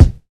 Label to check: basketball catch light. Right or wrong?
Wrong